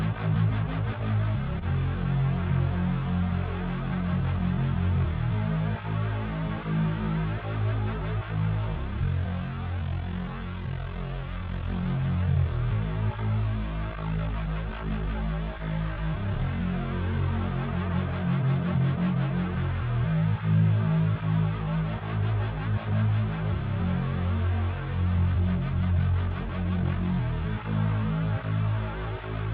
Synth Prophet Bass Coda

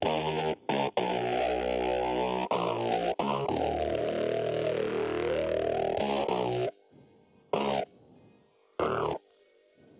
Bass Chorus Into Intro

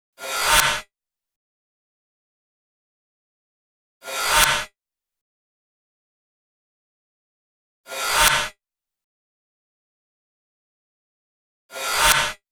beat fx

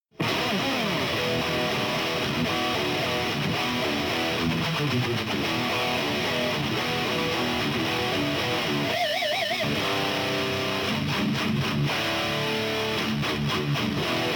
guitar

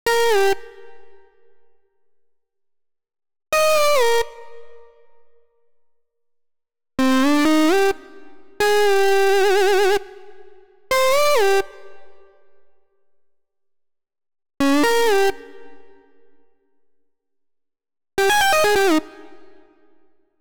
Guitar ish

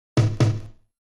battery htom; Multitrack; Stem Kit

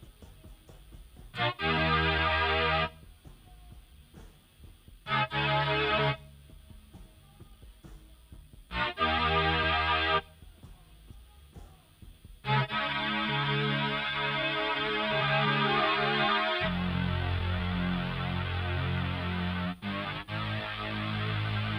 Synth - Prophet Harmony

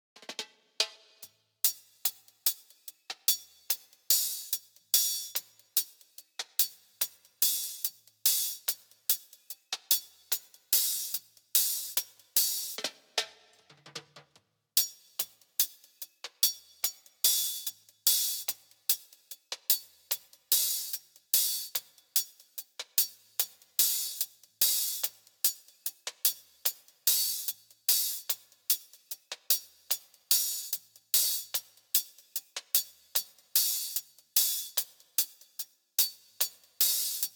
Cymbals

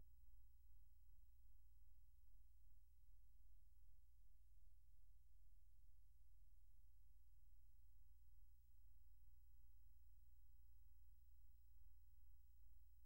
vocals

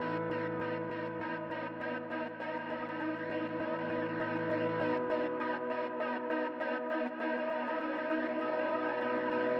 organ

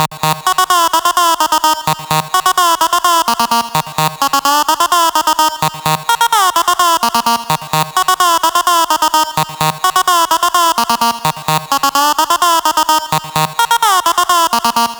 128BPM Lead Loops - D#; MELBOURNE DROP VOL. 4

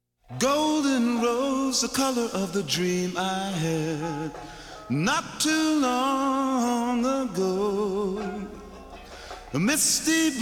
vocals

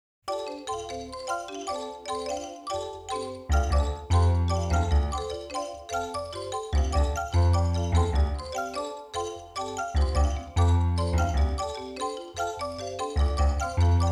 Marimba Bass; Middle8

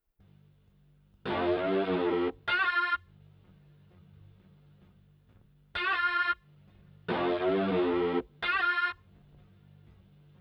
Synth Fairlight Koto

